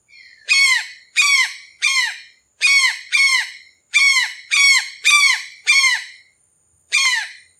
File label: jungle bird; dfcaverns; df ambience